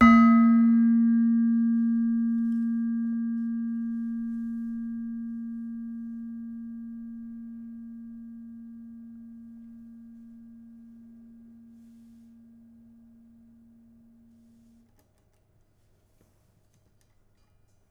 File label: Demung, gamelan